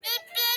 monster beep beep

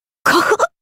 cough; Majin Okita

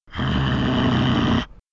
growl; mogar

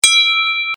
iphone bell

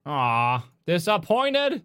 aww disappointed

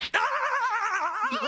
throws a rock; krillin; at goku

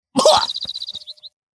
cough; hooty bug

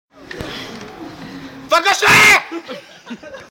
vocal

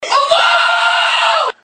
random screaming

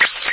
zoom out sound; lego star wars

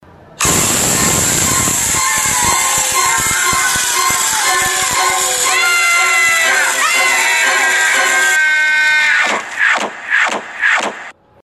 exploded

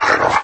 move; anubite

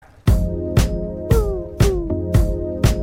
jungle casio

